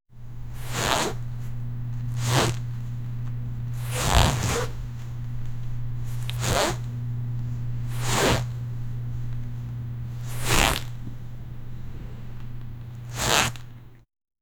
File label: sliding sound gentle wet; arrival